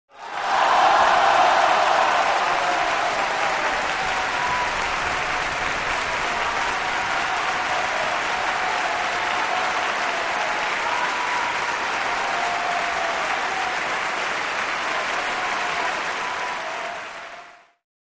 Concert crowd, long applause at end of show

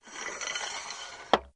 Tripod Leg Telescop

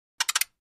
Flash Gear Four Quick, flash gear

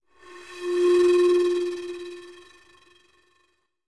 Eerie Flute Flutter

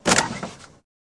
Auto Crash Med Head O, car crashes

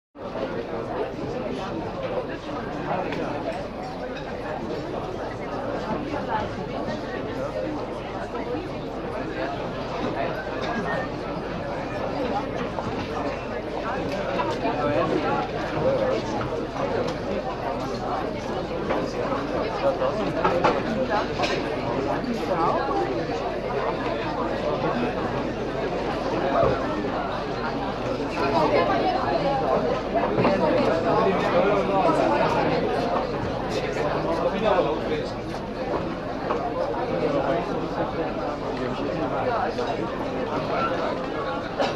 Casino Crowd Animate

